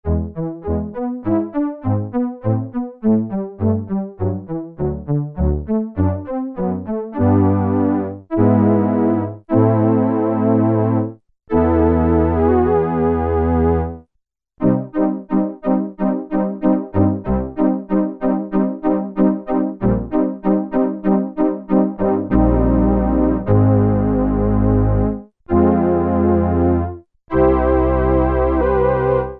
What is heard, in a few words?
Korg Poly-800, Bowed Cellos